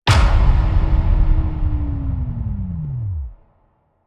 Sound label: Unplugged Hit Downer; Ninja Tracks